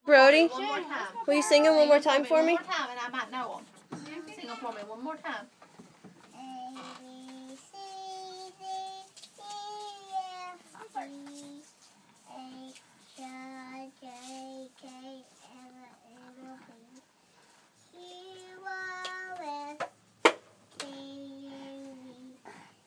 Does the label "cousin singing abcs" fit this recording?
Yes